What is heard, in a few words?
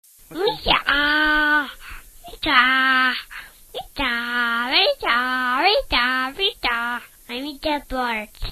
bird; mee taw; children